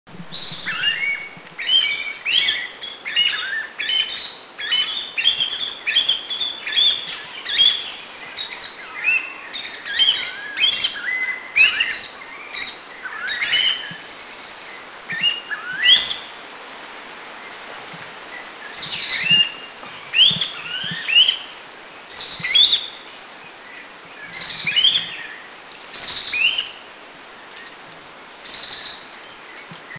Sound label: Rufous Coucal; Philippines